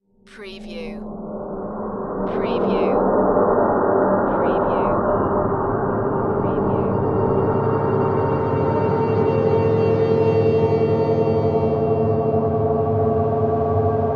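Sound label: Production Element Swell Ominous; long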